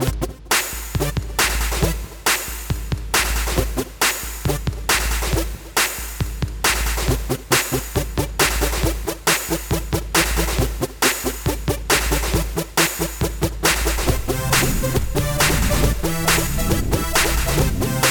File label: Jumpy Jolly Groove